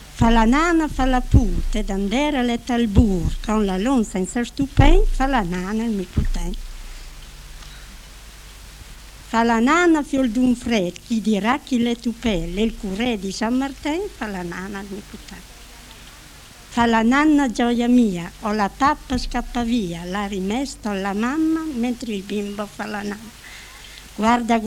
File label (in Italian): fa la nana fala pur